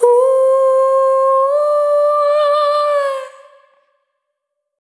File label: Single Voice Melodic Loops; Singles